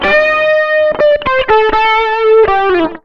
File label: SOLO L, Electric & Acoustic Guitar Loops